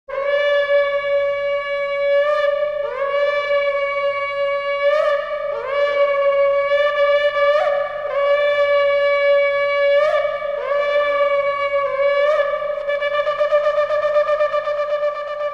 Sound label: shofar